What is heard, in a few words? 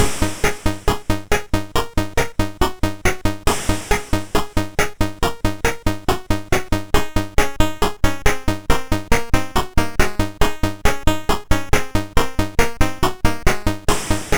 Intro, MOS6581R3; Kaiser